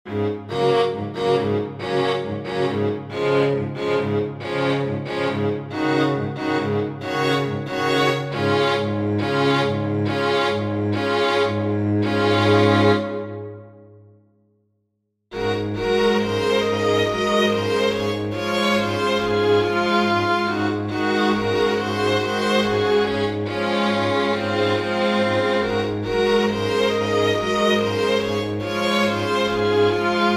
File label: serenade from the petite suite for string quartet; borodin alexander porfirevich